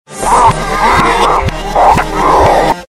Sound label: reversed